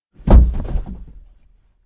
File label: body in car